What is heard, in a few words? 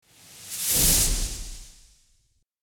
Smooth high frequency whoosh; transition